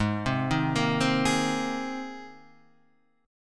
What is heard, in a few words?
G sharp Major Add 9th, Guitar Standard; arpeggiated; 4,3,1,3,1,4